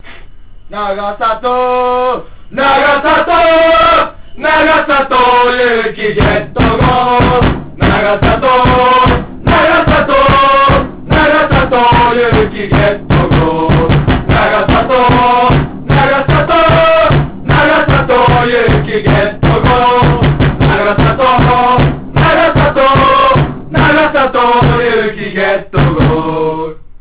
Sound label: song